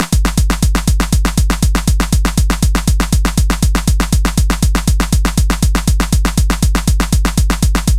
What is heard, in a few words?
Groove 120bpm; Drum beat; made with groove pizza